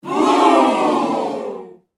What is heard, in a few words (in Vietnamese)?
Tieng khan gia noi wu